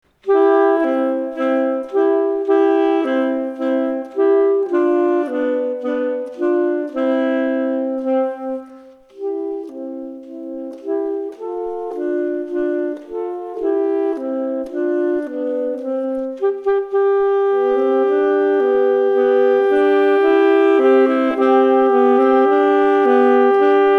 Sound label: Duet